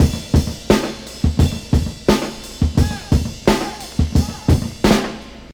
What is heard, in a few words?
87 bpm drum beat e key